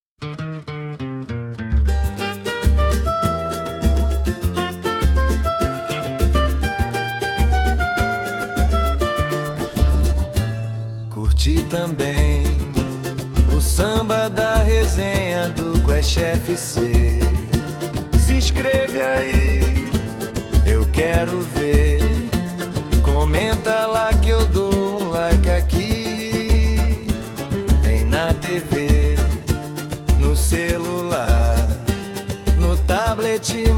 samba

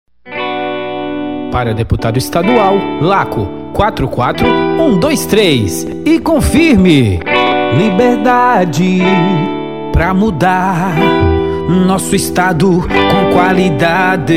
jingle deputado

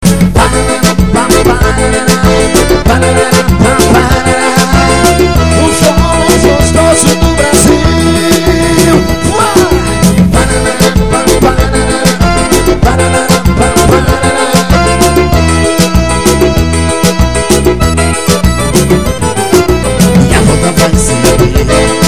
forró

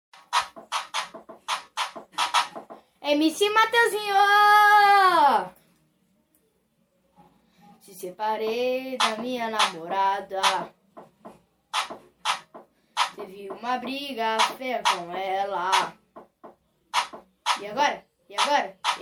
brega funk

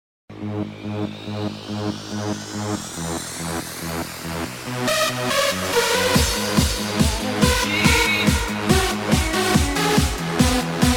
pancadão automotivo

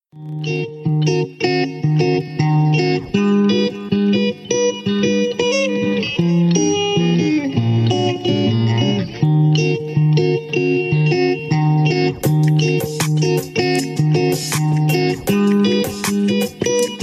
vai dar samba

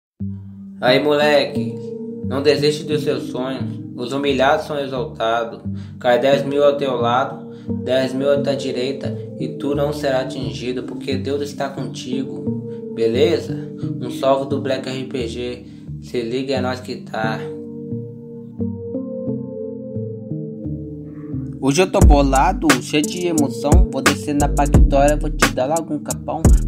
treinando rap

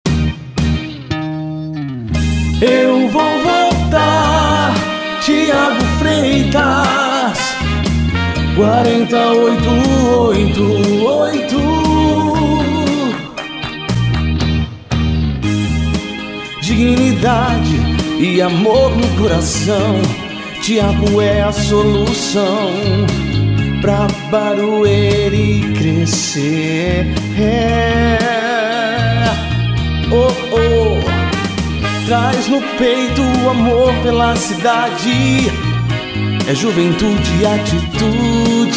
jingle

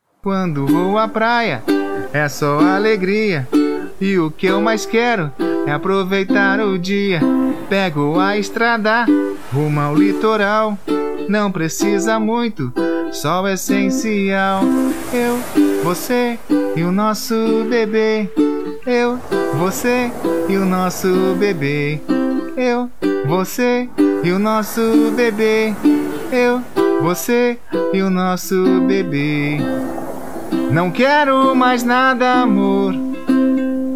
voz e ukulele

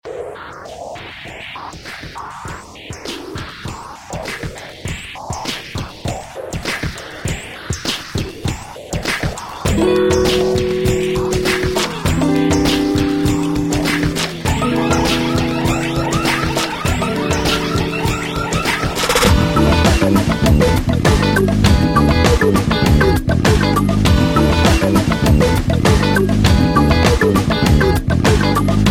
trance regional

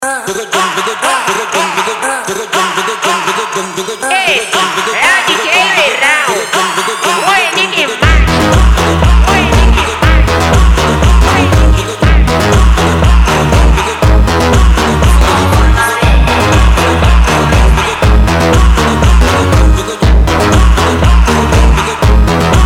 ritmo insolito

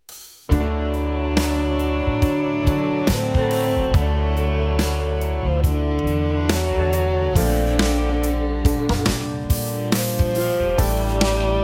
hino da harpa crista; o santo espirito

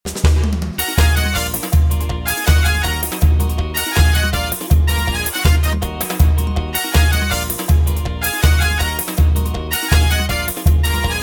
trompeteando o timbal

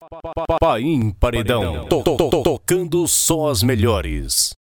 Vinheta paredão; Vinheta pain paredão; Composição: STUDIO WS

